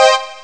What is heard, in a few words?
c minor; Amiga Soundtracker Sample Packs